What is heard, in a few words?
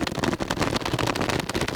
Stretch Loop, Foley Creaks; SFX Kit